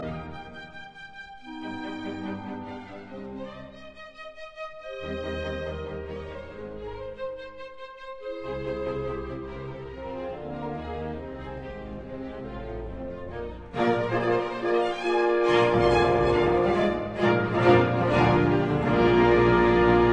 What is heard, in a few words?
Concerto for 2 Pianos in E flat major, Allegro